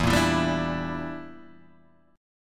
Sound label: E Minor 7th Flat 5th, Guitar, Half Step Down